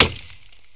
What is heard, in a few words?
click; CAMPING